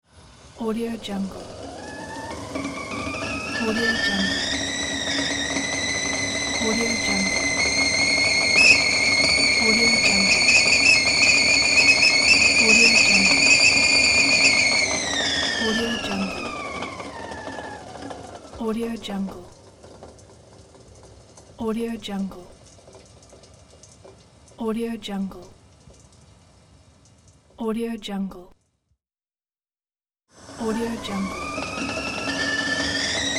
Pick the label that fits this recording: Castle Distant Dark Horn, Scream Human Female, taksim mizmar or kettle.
kettle